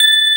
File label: PC BELLS; AKAI S6000 CD-ROM - Volume 1; Strings; POP STRINGS